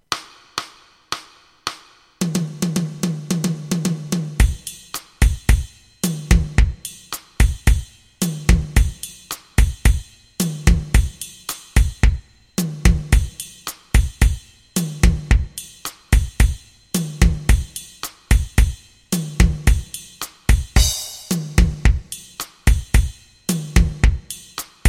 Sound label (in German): latin etuede